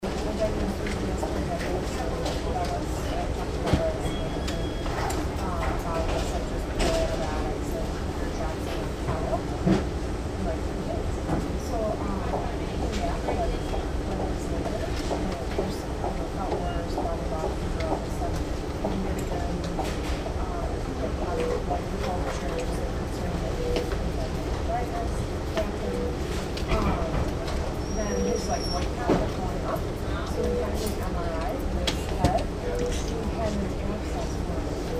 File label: Healthcare Simulation ED Background Noise